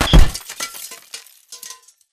Drone Death Explo Close; Titanfall 2

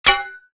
imp bullet light ballistic titan; Titanfall 2

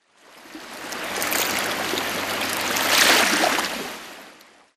swampland water lapping; Titanfall 2

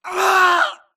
grunt death crush; Titanfall 2

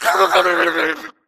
grunt electro; Titanfall 2

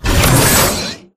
atlas eject servos; Titanfall 2

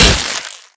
imp bullet generic headshot android; Titanfall 2

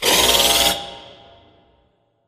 Tube Alarm Close; Reaper; Titanfall 2; Boomtown

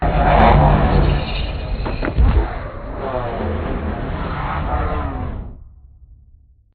strafe close; Beacon; Titanfall 2

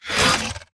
Mvmt Servo Light Short; AI Zombie Stalker; Titanfall 2

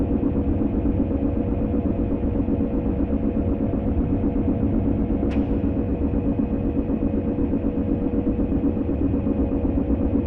Prop Warehouse Prop Rack Move Dist; Titanfall 2; Boomtown

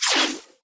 imp bullet generic titanshield; Titanfall 2

